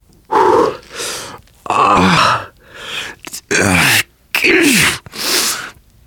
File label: exertion critical, survivor voice biker